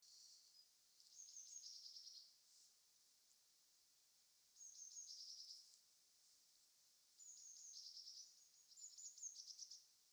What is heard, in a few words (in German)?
Blaumeise